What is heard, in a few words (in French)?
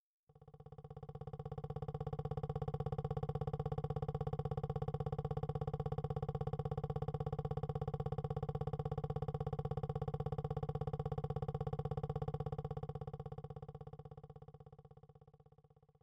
moteur osc